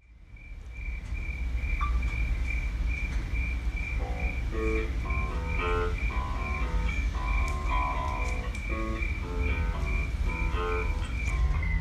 instrumental

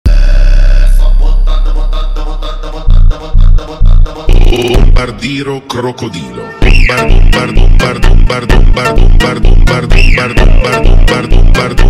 funk; bombardiro crocodilo

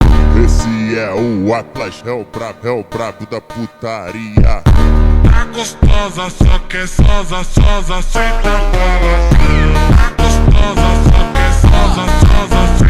montagem brazilian phonk

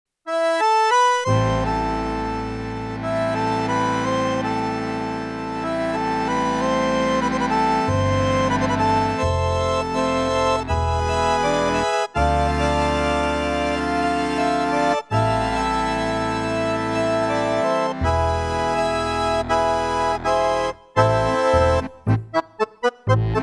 accordion cover; bella ciao

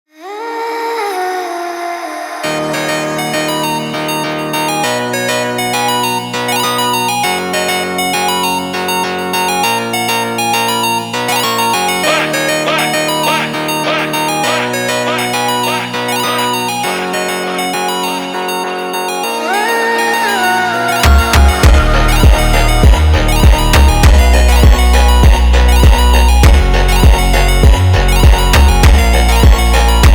sped up

